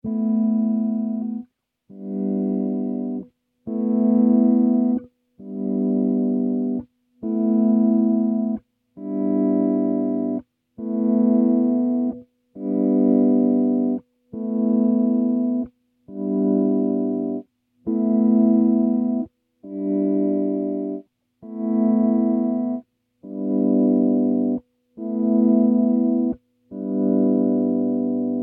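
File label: Em 135 guitar loops solos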